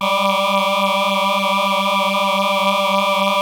PPG VOX B G4; DIG VOX; Music Store - Claudius Diemeter Schwerlos

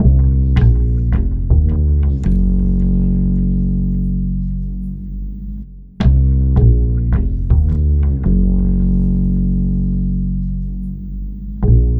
Remixed Acoustic Bass; Sonic Foundry; Leo Cavallo Bass Taster

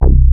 Moog Sub C0; Proteus 2000; Multisamples; Digital Sound Factory Proteus 2000

